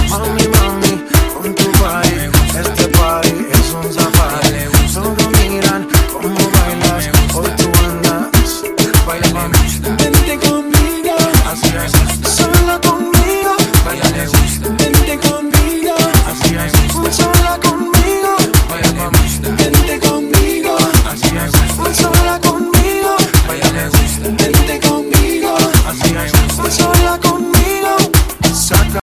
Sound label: mix reggaeton ringtone